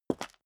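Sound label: step high heels concrete grit single right, footsteps; schritte; menschen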